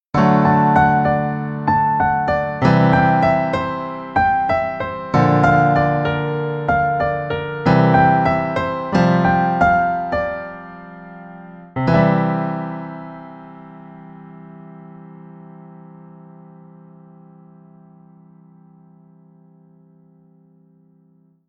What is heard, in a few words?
piano solo